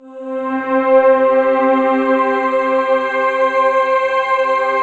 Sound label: PAD C4; Best Service Gigapack 1